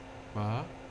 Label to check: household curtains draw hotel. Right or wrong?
Wrong